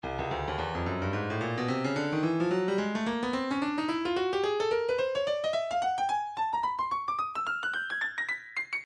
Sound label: breakbeat 109 bpm; soho a; Stylus Breakbeat Midi Files